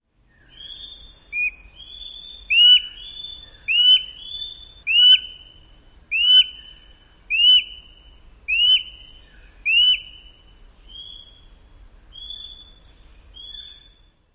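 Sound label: more squealing calls, Sharp-shinned Hawk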